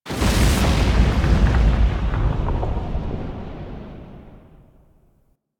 Destruction Heavy Hits; Ambience; Warframe; Corpus Railjack

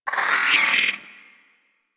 Hyena Micro Vocal Angry; Warframe

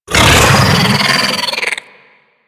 Pet Vocal Angry; Enemies; Vocals; Warframe; Alad V Pet

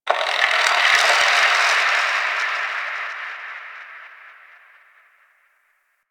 Misc Creepy; Ambience

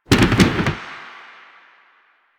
Slide Boost Backfire, Motorcycle; Motorcycle Ninety Nine

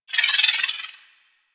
Pistol Proj Whiz, weapons; Warframe; Thanotech

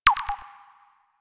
Objective Completed Beeps; DE; Warframe

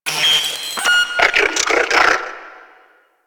Fortress Rescue Door Unlock; Ambience; Warframe; Grineer Fortress

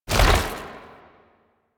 Tank Infested Arm Move; Enemies; Movement; Lasrian; Warframe

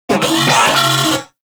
Vocal Enemy Spotted, Enemies; Warframe; Moa

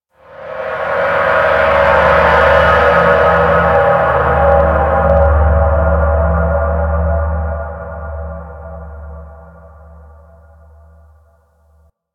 Hub Tonal One Off; Steel Meridian; Warframe; Tenno

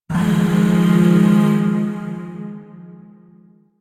Shawzin Min Chord F; Music; Chords; Warframe; Zariman Void Shawzin; DE

